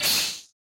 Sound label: snake attack